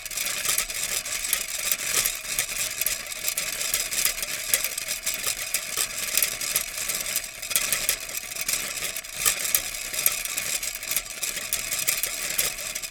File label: Handheld Blender Constant